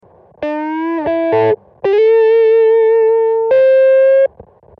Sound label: solo lick